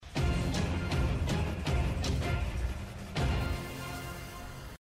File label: end battle